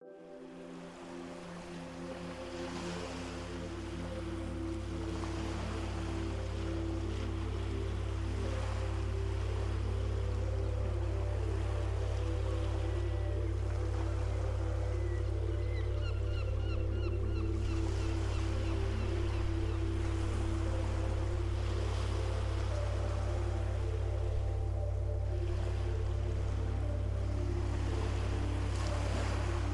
dreamy day music for focus with ocean sounds and gamma waves